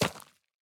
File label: stones, walking, pebble; Factorio